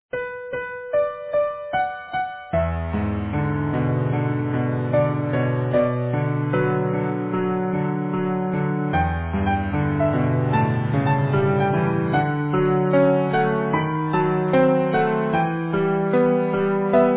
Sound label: Easy Piano